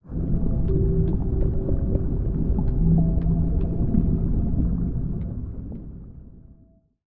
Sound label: rift event underwater; astral planes; rifts